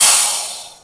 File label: airbrake